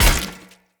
Sphere Polearm Medium Impact Flesh Armour Hollow

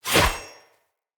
Short Sword Throw; Weapons; Player

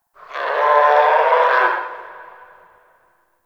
Forest Creature Mid Far, Ambience; Warframe; Grineer

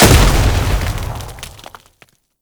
Orbital Strike Explosion; Enemies; Abilities; Warframe; Tau; Dax Heavy

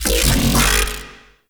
Alt Fire Growl, Weapons; Warframe; Corpus; Tenet Quanta

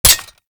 Revolver Rifle Mech; Warframe; Tenno

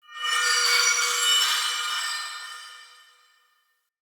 Witch Station Ruins Soul Chime; Ambience; Soul Plane

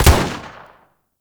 Shield Lancer Pistol Shot 3D; Warframe; Lasrian Soldiers; Weapons

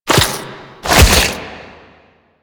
Proto Infested Mutalist Speaker Start Flesh; Warframe

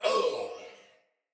Death, Barks Battle Soldier; Sinecure Soldier

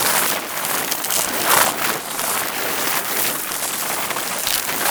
Grounded Hammer Loop; Ultimate Abilities; Unairu; Warframe; Focus

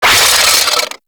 Drone Blade Jump; Warframe

